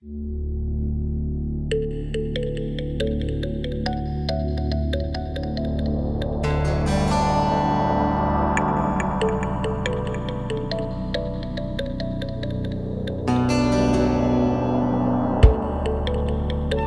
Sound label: Brand Music; Northern Latitudes